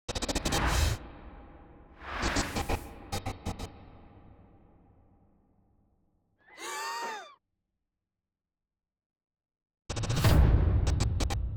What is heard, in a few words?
SFX; Next 15 season 1; Next 15 clip spot; Launch